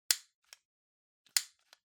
Lighter Click

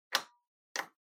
Doorbell Mech Buzzer, Button

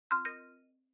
OS Sound (Speaker-Notification)

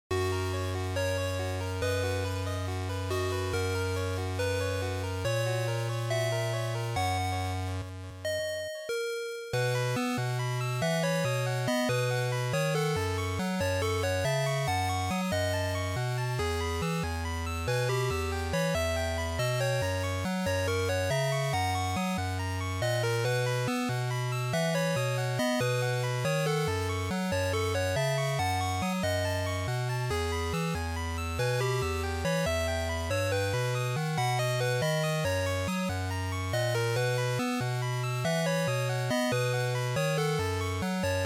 GB RPG Title

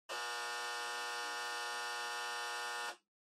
Flip Clock Far Buzzer 60Hz

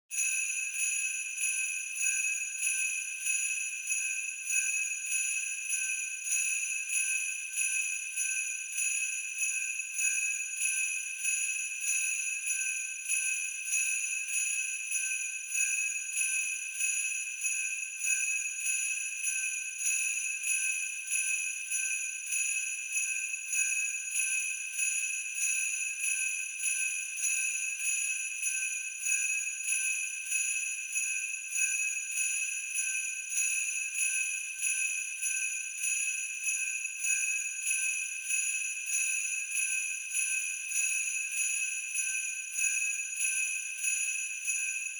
Sleigh Bells Reverb Shake Gentle

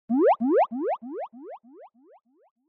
Anime Motion Delay

